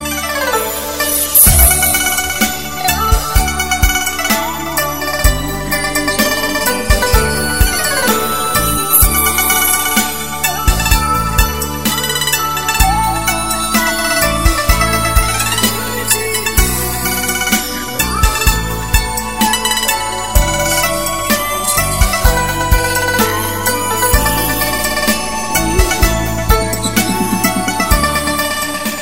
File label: Mixed Chinese